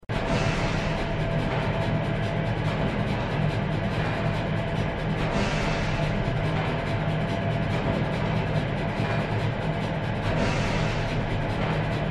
Boiler Room Ambience; escape the backrooms; Level 5

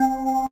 Rock Organ; Puchicon; dsi ds

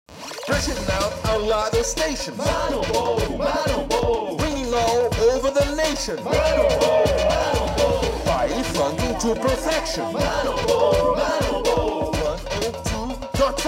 Radio Car Hiphop Jingle; gangstar rio city of saints

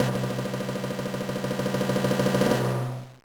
Drumroll; magical quest 2 starring mickey and minnie; gba

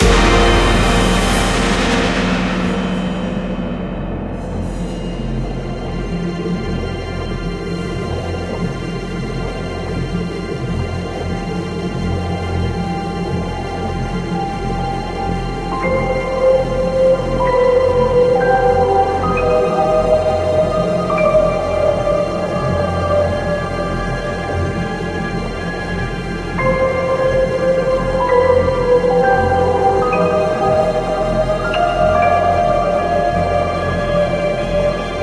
Collapse, gamerip

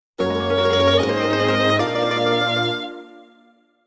Jingle Open Levelcap; Pokemon Masters EX

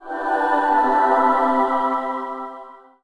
fanfare resurrect; Spiral Knights

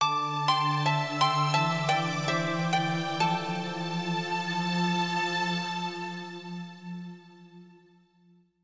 Jingle Lose; Choju Giga Wars; 3ds ps4 switch

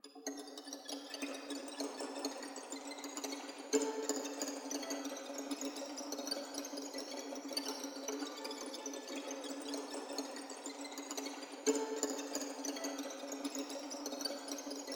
Boss Combat Crab Spawn

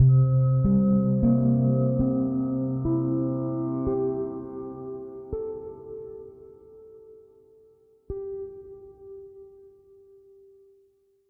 Stinger Wake Up; pan pan a tiny big adventure

